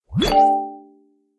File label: broadcast